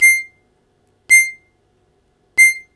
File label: Short Attack C4, Harmonica; Zero G - Harmonica